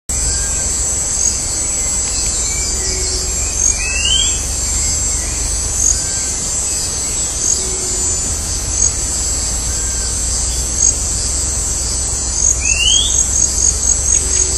Cnemotriccus fuscatus; Virocay; Jorge La Grotteria

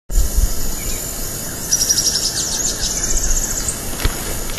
Phylloscartes sylviolus; San Sebastian de la Selva; Jorge La Grotteria

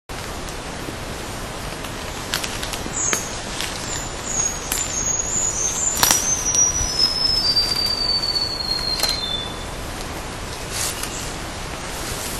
Myiothlypis leucoblephara; PP Esmeralda; Jorge La Grotteria

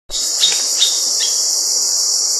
Dendrocincla fuliginosa; Reserva Surucua

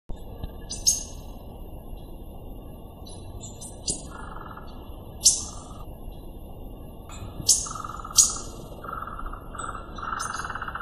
Cissopis leverianus; Karadya; Jorge La Grotteria

